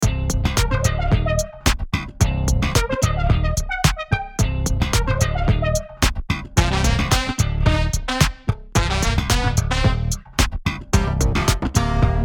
Rock

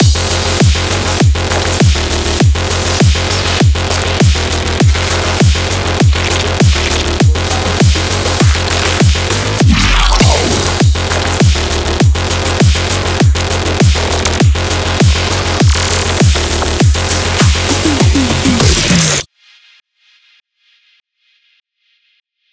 Bass

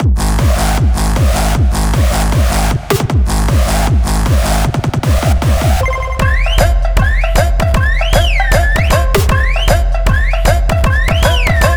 Hardstyle

